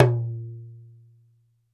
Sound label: Talking Drum tone; E-MU Producer Series Vol. 7 – Old World Instruments